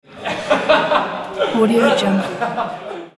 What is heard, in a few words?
men laugh